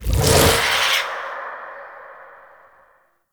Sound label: Noctua Alt Shot Whispers